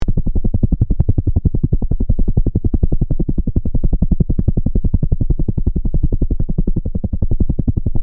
heli fly, heli

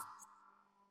event water

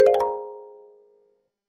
correct

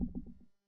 error

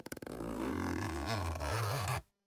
case open

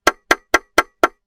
build

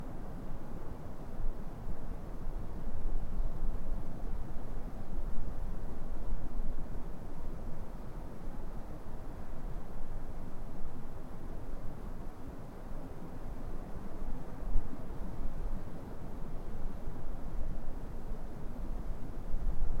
ambience winter

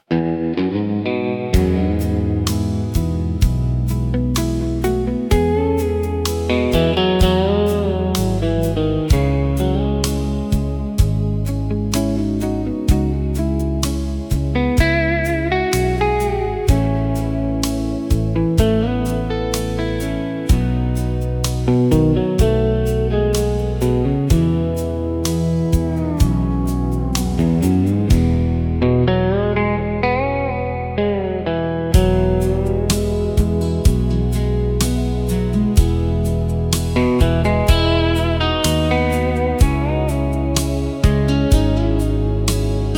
metronome

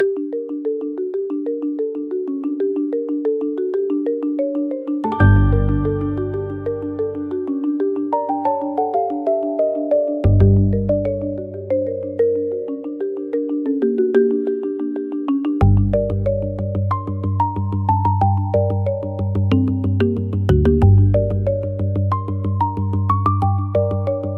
gears and marbles

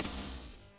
ingredient correct

